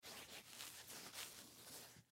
Human Hands Rub, Hands Aftershave Rub Hands; Aftershave Rub Face; Blastwave

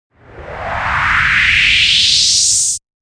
Rush Long, Rushing sound; Rushing sound for video editing, movements, logos, flash, or animation.; Sound Design

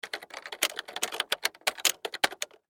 Computer Keyboard Typing; Technology; Offices

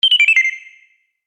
Science fiction Activate Sound

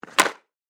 Hockey Puck, Shot; Sports

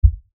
LFE Hit, Lfe Hit Low Bass Impact; Blastwave; Sound Design

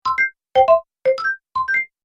Game Correct Soundpack, Video Game Sound Effects

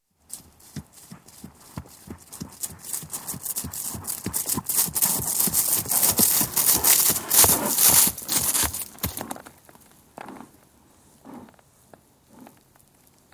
One horse trots past through grass, right to left; Horses Trotting; BBC

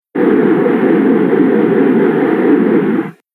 Space Gas Long, sci fi sound effect; Science Fiction Sfx Noises; Andrew Fleming

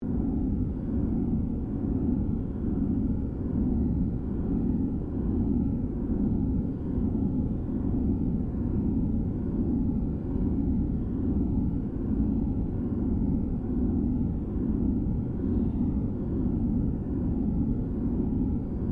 Created Drone, Drone Sound Effects; sound building blocks created through manipulation of raw material

